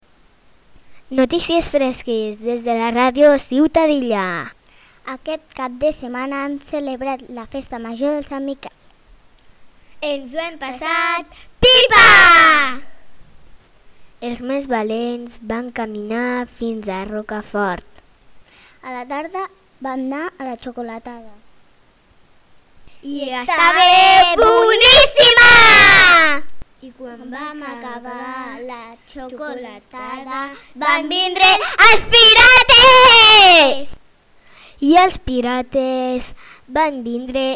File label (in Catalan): festa major; ciutadilla